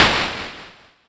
Noise

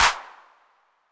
Clap 808 Saturated B; Hand Clap; Multi Saturated

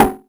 Metalica

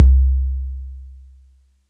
Tom C2

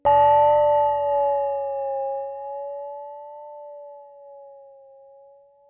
Bell Intervalz; Twilite

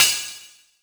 Crash Cymbal

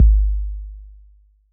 BD 808 Decay

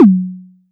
Tom; TRX

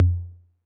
Tom Low 808 Sat, Saturated

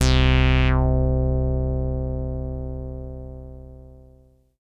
BASS

